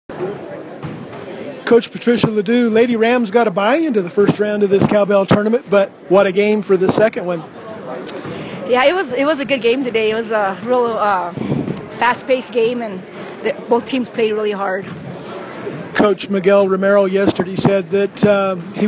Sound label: Cowbell; Cimarron